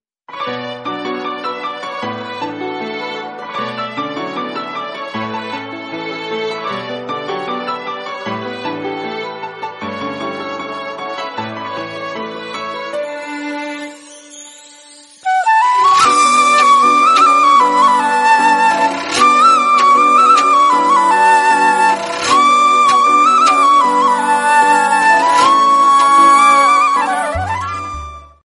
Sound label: Female Song Instrumental Ringtone